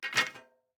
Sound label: DICE on Metal, Grab, Standard, Four Dice; Samples; ArticulatedSounds; DICE Sfx Party (Extended)